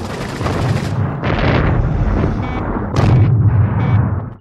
OBSTRUCTED EXPLOSIONS AND BEEPS

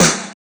00s sizzly steel snare drum f key; snare drum samples

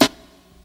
old school hip hop snare single hit c sharp key

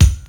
snappy kick drum one shot c sharp key

